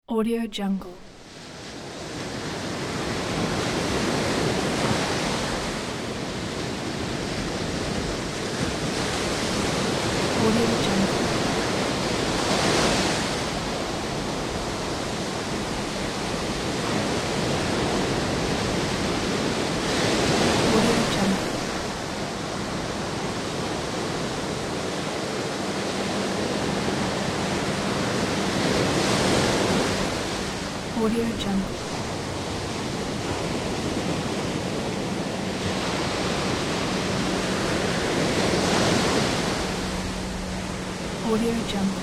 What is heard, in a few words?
ocean storm